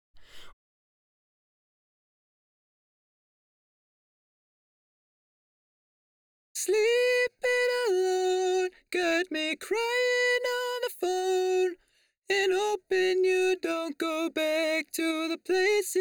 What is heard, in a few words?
Harmony DRY, Acapella, B major 160bpm; Ghosthack; Emotions- Acapella Collection